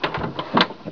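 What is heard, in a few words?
door open; TNP demo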